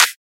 trap clap single hit f sharp key, Royality free hand clap sound tuned to the F# note.; single hits one shots